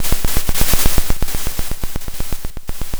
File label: Sound of a drum roll